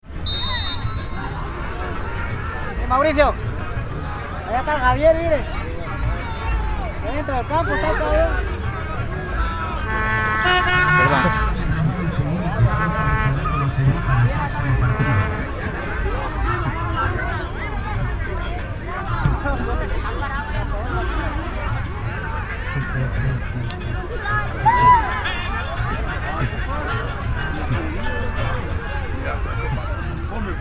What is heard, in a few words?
Potrero Soccer